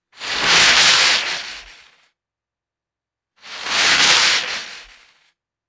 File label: fast resonant wax paper whooshes